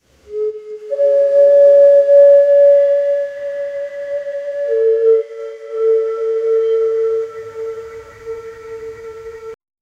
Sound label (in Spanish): flauta prehispnica suave, wind instrument